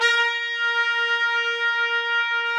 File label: TRUMP